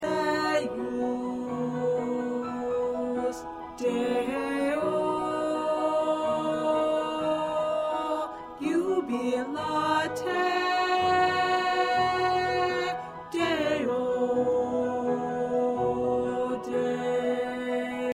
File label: Tenor 1